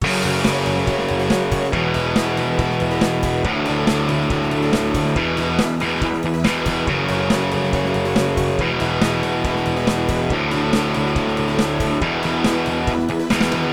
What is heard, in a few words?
Compressed Rock n Roll